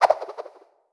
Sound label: Run; blue archive